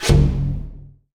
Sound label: ice activate; smite; god merlin